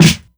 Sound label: clear snare sample E key